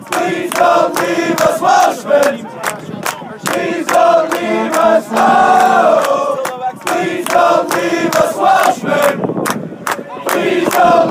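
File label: fanchants, football chants; dont leave us welshman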